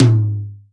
tom loud

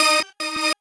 normal hit normal

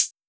soft hit normal

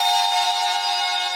synth main

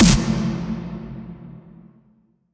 snare echo

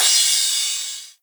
cymbal

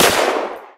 drum hit finish; osu

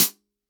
hat dry

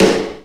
drum hit

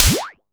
normal hit whistle; osu

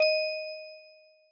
D#6 Eb6, Music Box; osu

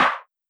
clap low pass

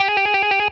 trill x 8 (9)

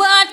vocal

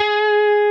Left-hand vibrato

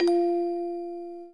orgel

